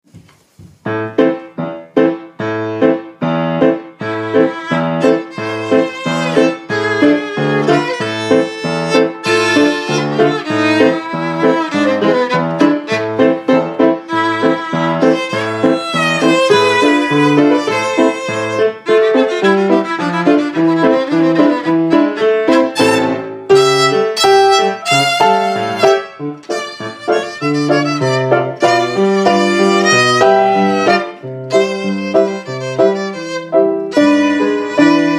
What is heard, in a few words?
Hungarian Dance